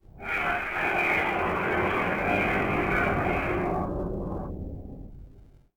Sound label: ambient Voices; XDK4627 BIOS and XDK Launcher and Dashboard(for Retail); ogxbox